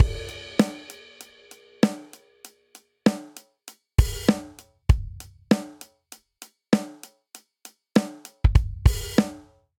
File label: Straight Hip Hop Intro 98 bpm; 4 4; MIDI Clips; Ableton; Factory Packs; Drum Booth